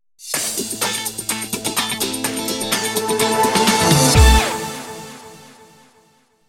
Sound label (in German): Auftrittsjingle; feintonstudio